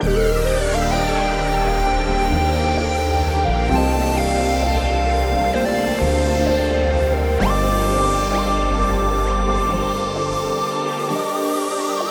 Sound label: 130 BPM G Min, Synth; Melody Loops; Synth Collection; Cymatics; ANALOG EVOLUTION Beta